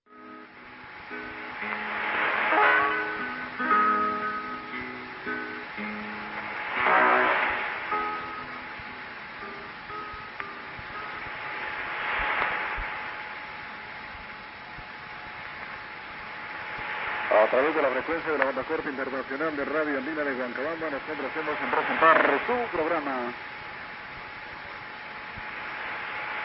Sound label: Huancabamba 6672 kHz; Peru; R Andina de Huancabamba